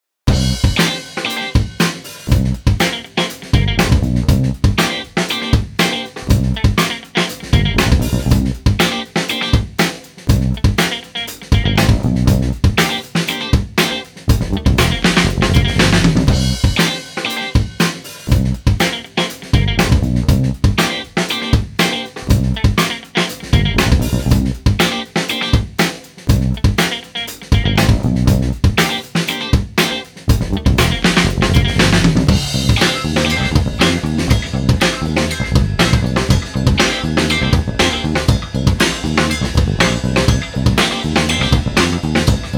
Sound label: Blues Rock Funky Situation no Leads 115